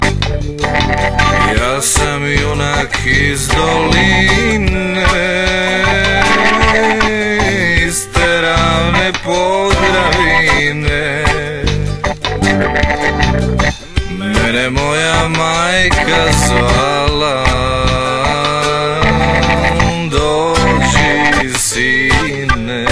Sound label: Blues